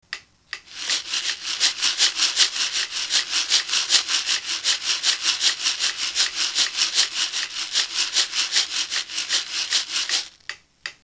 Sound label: Rhythm